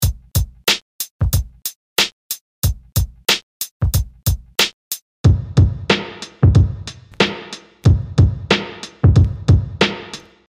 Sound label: Full Loop, dusty drums; three standard FL Studio drum sounds with no effects, and then the second half with this method implemented